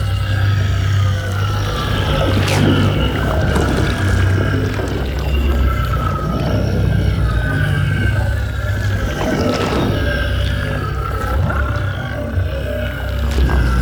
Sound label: Mushroom Large Loop; Ambience; Props; Warframe; Nokko Colony